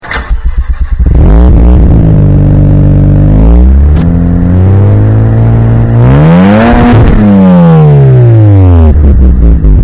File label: car rev, rx7; cars